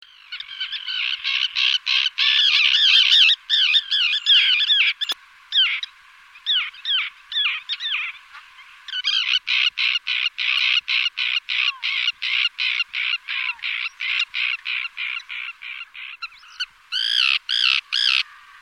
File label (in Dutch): Visdief, vogelzang; Cursus Vogelzang